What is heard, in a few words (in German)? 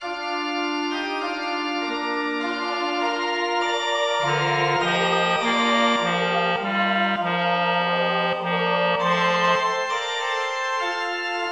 Kyrie B; Stille Nacht